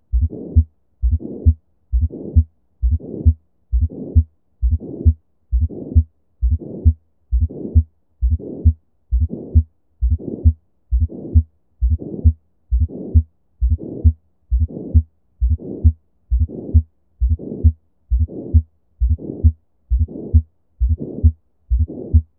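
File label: Apex, S4 & Mid Systolic Murmur, LLD, Bell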